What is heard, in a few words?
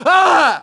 pain, grunt; nimble grunt